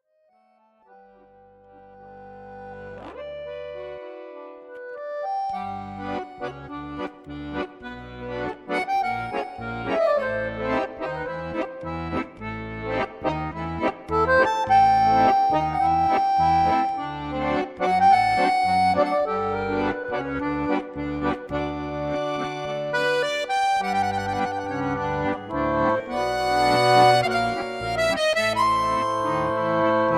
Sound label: accordion; zzmusic